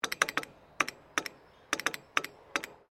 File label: MORSE CODE TAPER SEQUENCE